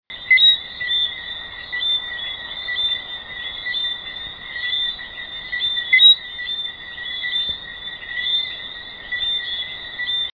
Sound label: eleutherodactylus johnstonei mount hartman bay grenada; Its call is a sharp double whistle, the second syllable being higher-pitched.; West Indian